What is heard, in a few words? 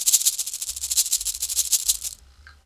SHAKER FX, tamb & shaker; PERC LOOPS; Dub; Drumdrops In Dub VOL-1